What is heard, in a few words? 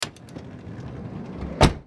Auto Close Door Exterior